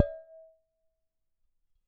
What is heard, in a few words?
Struck Vase